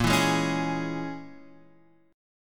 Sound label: A sharp Minor 7th Flat 5th